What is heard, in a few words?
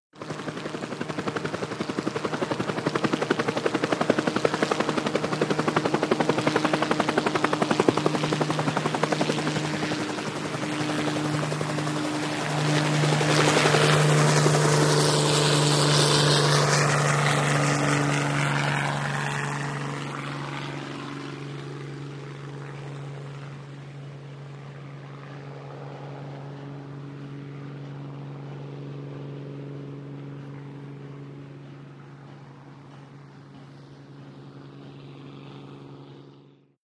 Heli Traffic Hover By, traffic